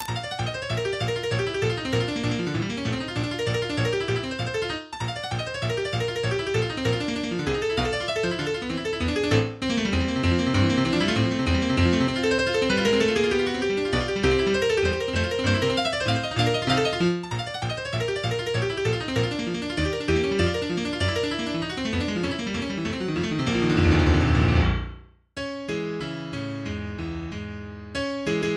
Presto in Bflat major

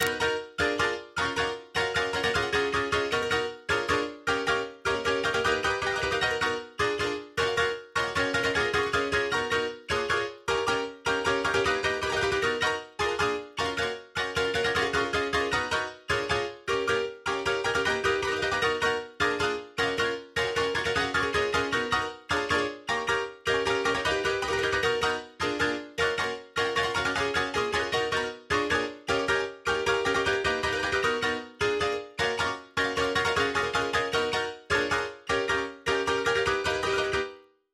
Drop Synth WET NO SC; Drop Synth 2

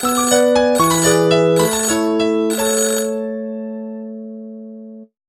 Nokia tune

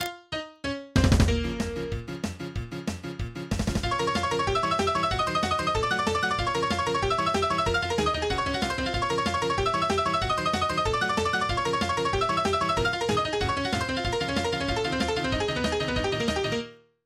Scary Swings

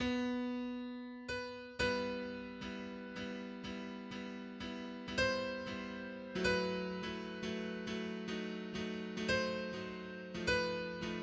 Prelude in E Minor

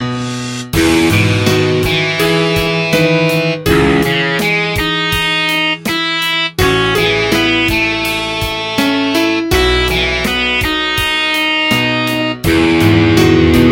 Piano Solo

